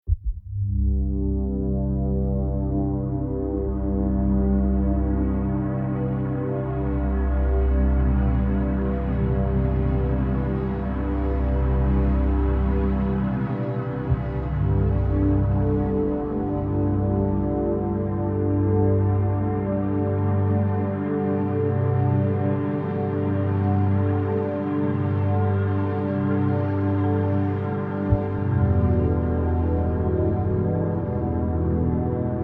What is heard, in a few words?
Drone Atmosphere Texture Musical Evolving Dream Sequence; SciFi Fantasy; Sound Design; Drones